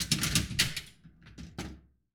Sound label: Pinball Ball Release